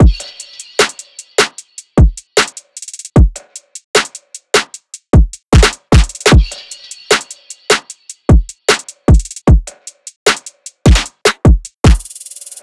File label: loop full 152; Seismic Loops